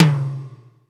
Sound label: HI CLR WET, TOM; East West - Global Sounds; D4 BD SD TOM